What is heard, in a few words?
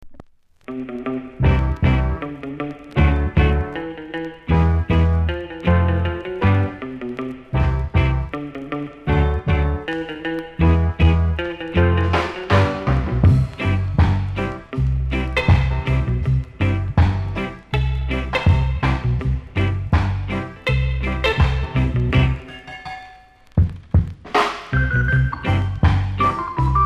ROCK STEADY; ska 2014